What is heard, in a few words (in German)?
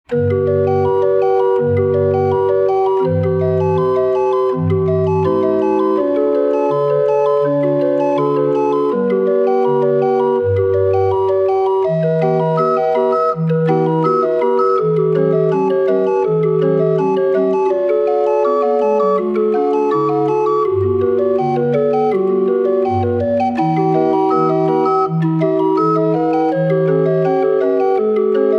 36er drehorgel; Ave Maria Schubert